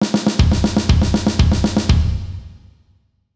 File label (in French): Break